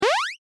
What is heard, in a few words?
ball; BrickOut